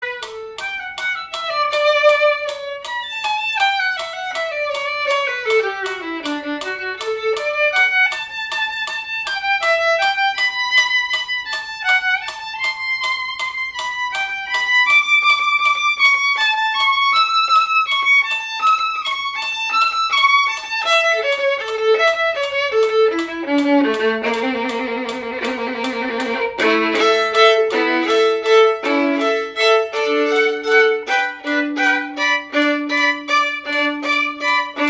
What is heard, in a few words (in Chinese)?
第四號學生協奏曲 第三樂章 小提琴 示範演奏; 賽滋; 新版 篠崎小提琴教本 第三冊